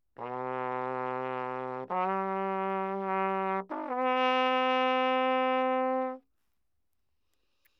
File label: natural baroque trumpet low, Anechoic; OPENAIR